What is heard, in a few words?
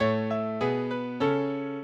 minuet, mozart